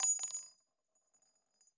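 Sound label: bullet case bounce 50bmg cement; gmod; viper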